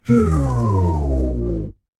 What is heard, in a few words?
feedback fail